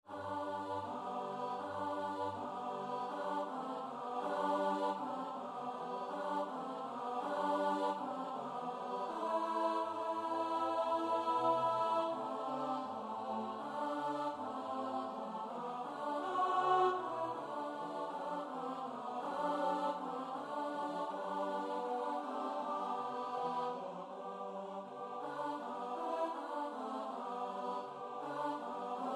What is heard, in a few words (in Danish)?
Gå ud og gå en tur alt; korsats